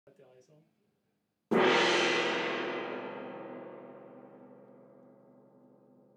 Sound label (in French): plus Moyenne, gong vibration